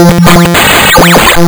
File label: lsdj waves; wavetables